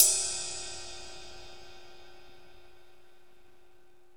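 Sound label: cymbal techno, Roland; Northstar - Drumscapes Roland; Med. Tekno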